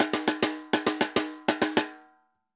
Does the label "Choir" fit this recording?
No